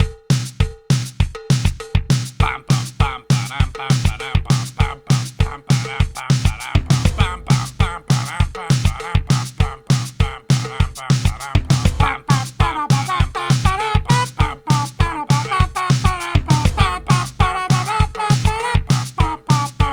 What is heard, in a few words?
Promo Background Music